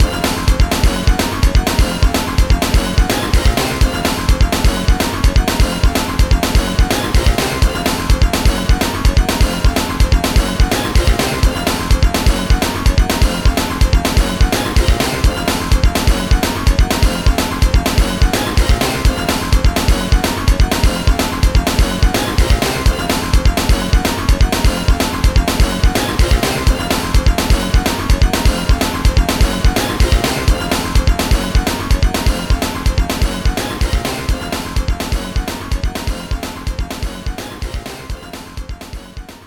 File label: BGM minigame boss